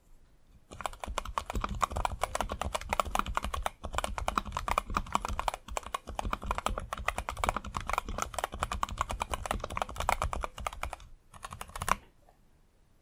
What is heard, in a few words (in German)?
Normales Schreiben mit Silenced Caps